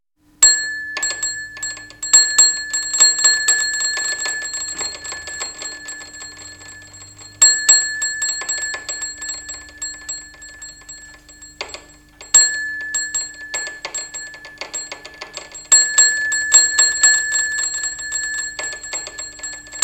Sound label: trains effects